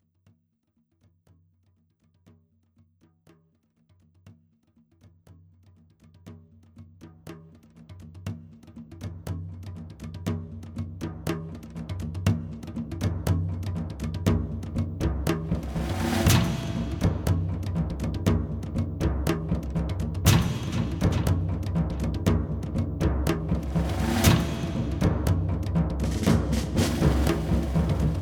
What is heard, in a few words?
Suspense; Stronghold Definitive Edition